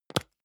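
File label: deodorant can lid open medium; haus-garten; badezimmer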